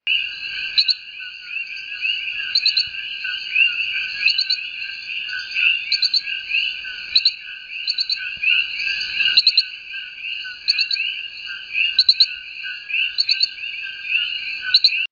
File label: call is a quick trill composed of one to five notes, quite loud for the size of the animal; eleutherodactylus brittoni; sabana grande puerto rico; This tiny species inhabits only humid grasslands and fern tickets; West Indian